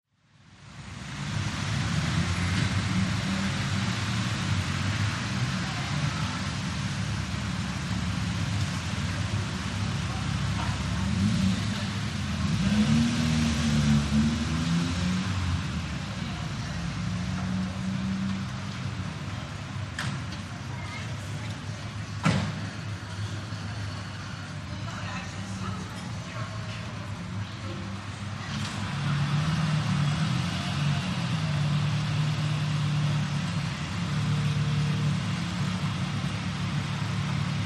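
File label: Paris Busy Side Street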